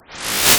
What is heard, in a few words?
EFX, SFX KIT; Wizoo - Platinum 24 Electronic